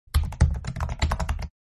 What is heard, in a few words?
MacBook keyboard typing